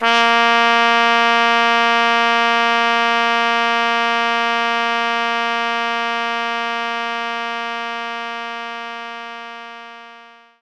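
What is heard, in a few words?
Trumpet A sharp 3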